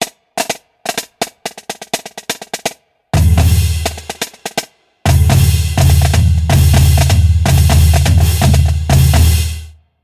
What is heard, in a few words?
Stinger; Beckwith Park